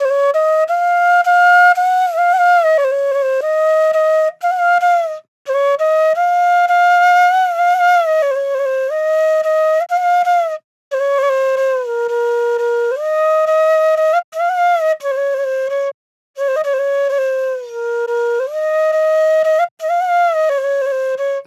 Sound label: Krisna Flute Loop 88 BPM G